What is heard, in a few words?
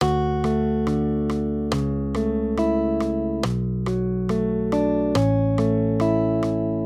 maj triads; accompaniment